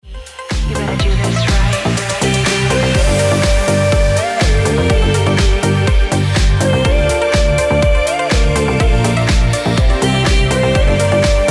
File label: Ringtone Deep House Music